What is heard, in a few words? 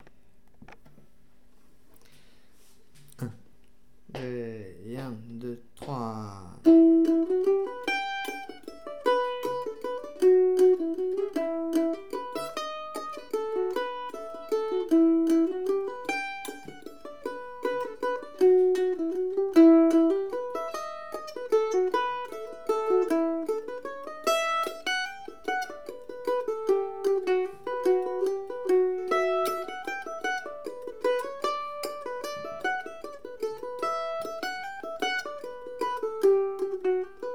waltz; Roly Gentle